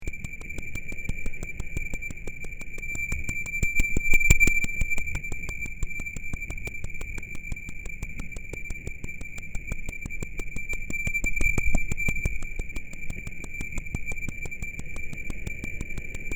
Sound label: wipers